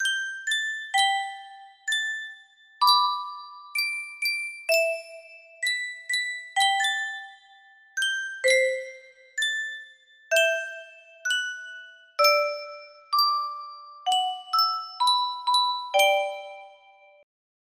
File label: sankyo custom tune music box